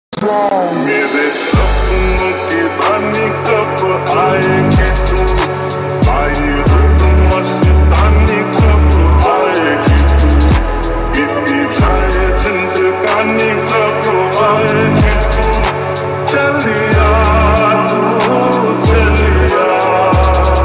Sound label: remix slowed reverb version ringtone; attitude ringtone